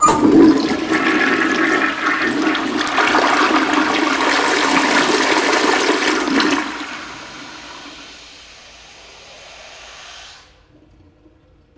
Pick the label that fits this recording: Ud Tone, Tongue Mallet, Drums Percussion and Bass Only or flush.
flush